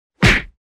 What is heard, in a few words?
fights